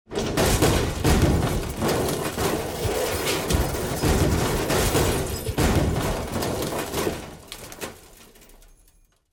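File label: car rolling over on the road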